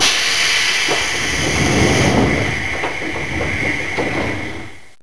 Door seibu 101 Close

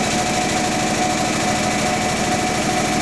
Compressor C2000BL Loop

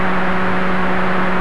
Blower JR101C

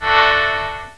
horn

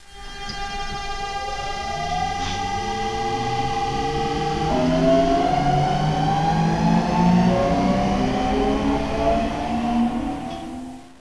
seibu 6000 vvvf 8bit

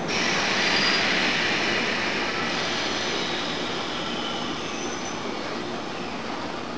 Brake

